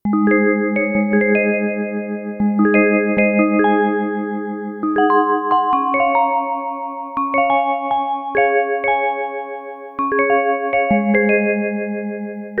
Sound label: Harp Bell Song; Bellmaster